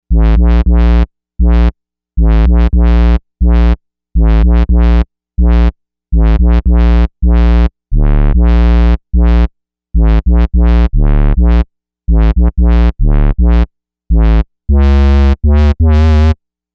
Fat Parrot, Novation UltraNova; 1992 - Today, Iconic Synth Sounds

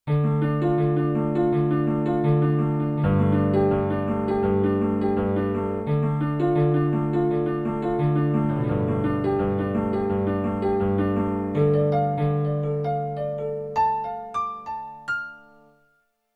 Nylon String Piano; Yamaha TX16W; Jazz Performance; Jazz Performance 2

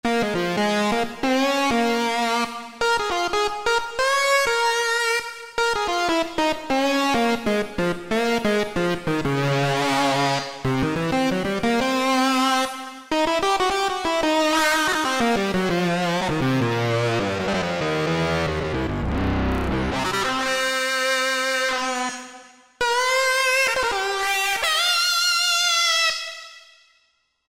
Slow Sync Lead, Roland JD-990; Factory Patches

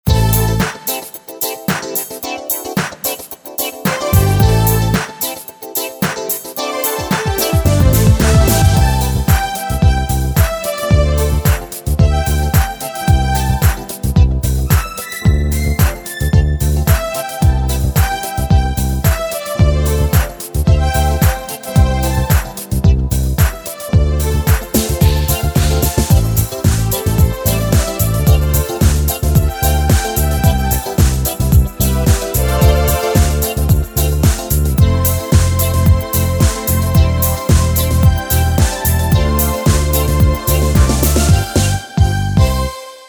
Yamaha PSR-550; Alive Fever

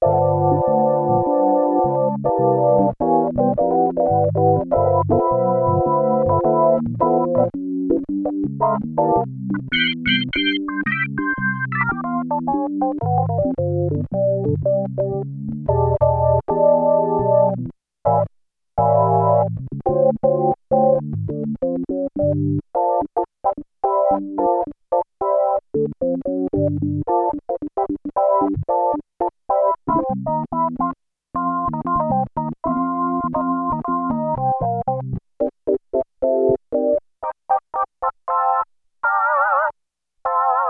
Organ, Roland JX-8P

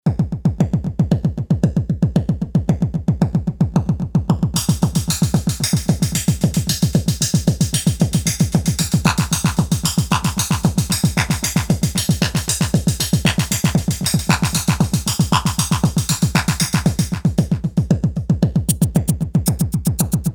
Tekno Drums; Alesis Rap Techno Dance

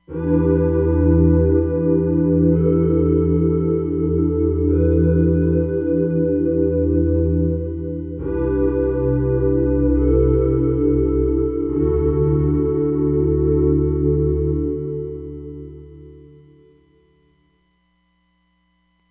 Flange, Roland DEP-5; Factory Patches

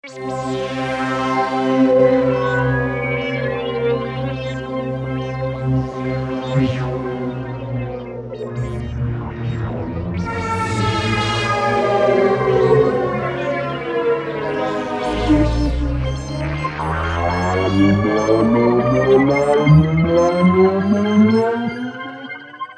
Labyrinth, Generalmusic Equinox

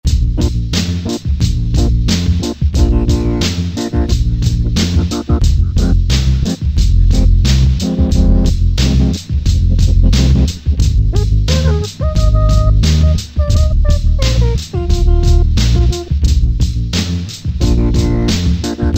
Dub Lander, Alesis Z1 Hip Hop

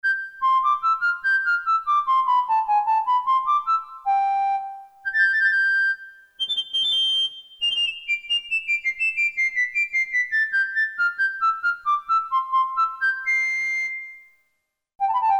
FLUTE, Roland Jupiter-8; Factory patches

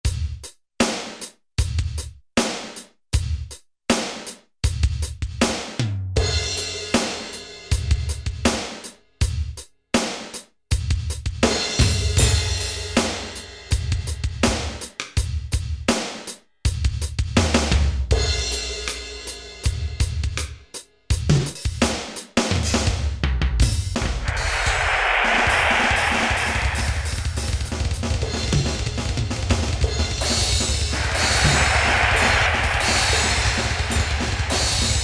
Hard Rock, E-mu Pro-Cussion; Factory ROM kits

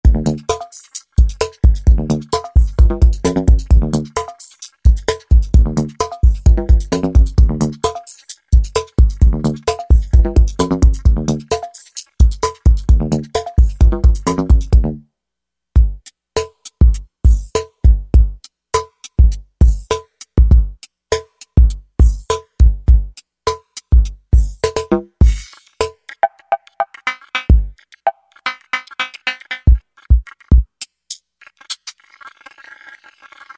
Analogic Kit, Roland V-Synth; Factory Patches

